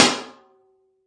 Metal Lid, Found Percussion Hits; ModeAudio Found Percussion Hits

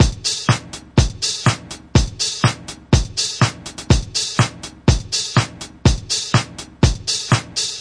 disco punk, drum loops and breaks

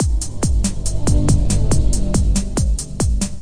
140 bpm gothic breathy break

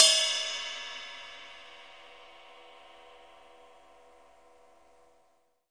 CYM RIDE, DRUMS SINGLE HITS

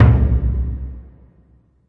big burundi, African Burundi Drums; ETHNIC and WORLD PERCUSSION

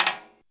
miyadaiko D3; ETHNIC and WORLD PERCUSSION

